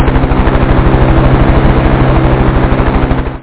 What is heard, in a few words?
CHASE